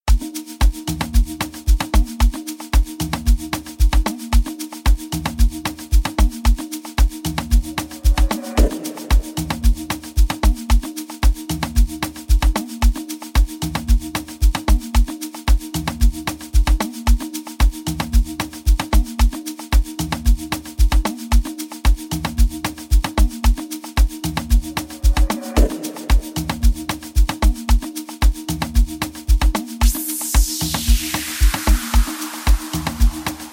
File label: Amapiano Remix